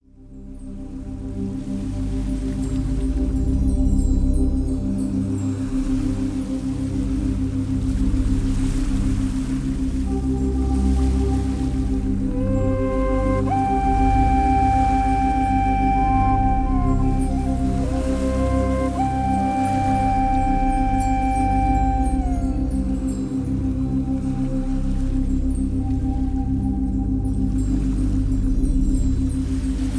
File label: Deep Meditation